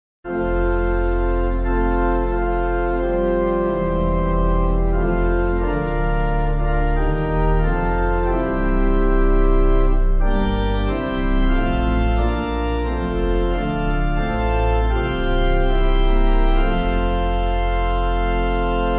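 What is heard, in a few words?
Pipe, Pipe Organ backing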